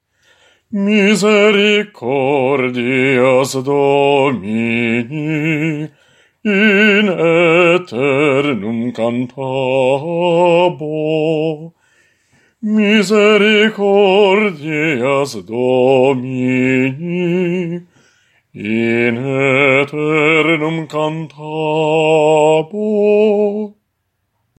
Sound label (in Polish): Misericordias Domini BAS